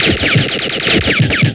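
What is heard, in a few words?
sword fight; Civilization 2 Multiplayer Gold Edition; MARS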